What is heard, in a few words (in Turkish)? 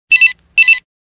Panasonic EB TX220 Zil Sesi